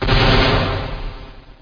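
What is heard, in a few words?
weapon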